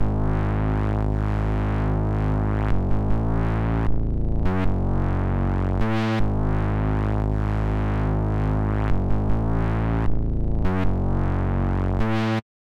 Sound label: 155 BPM G# Min ARP2600 Bass, Synth; Loops; Loop Stems; Cymatics; Fantasy Synth Sample Pack; Fantasy - Purple